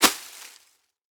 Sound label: Footsteps Leaves; Haunted Bloodlines; SFX Producer